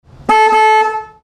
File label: Lotus Horn